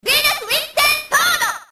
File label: wink sword; venus